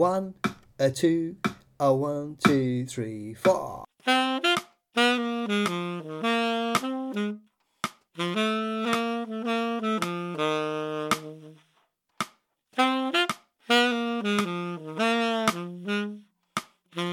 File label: Happy Blues TENOR; Tenor Sax; Lockdown Saxophone Exercises